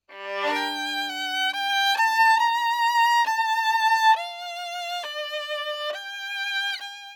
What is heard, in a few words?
chaconne